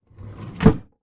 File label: household empty chest of drawers drawer close inside; zapsplat